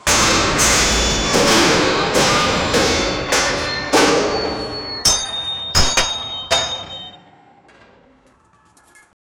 heavy metallic collision

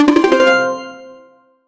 announcement sound effect

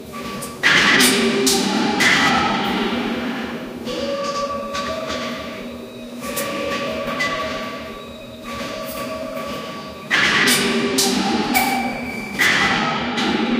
gym workout sounds

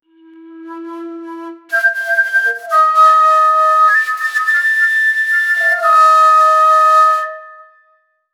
chinese long flute; chinese long flute for ba

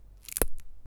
picking strawberry from b; garden

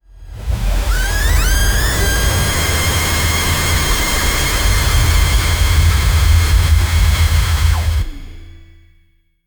cyber glitch whoosh synt; ninja

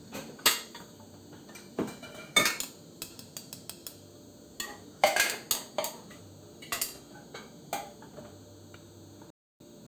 warm kitchen atmosphere, baking

